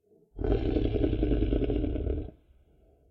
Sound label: Shadow giant grows and grumbles; SyrinScape SoundsScape Collection; bbh Haunting part 1; TTRPG